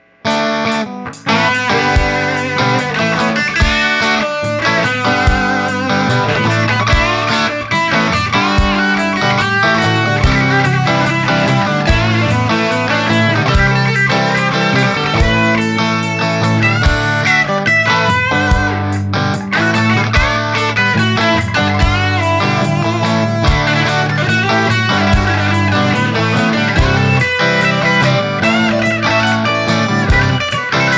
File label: Muzak